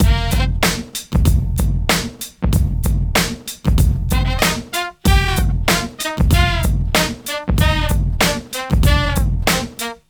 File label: Hip Hop Horns